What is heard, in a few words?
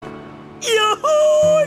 YAHOO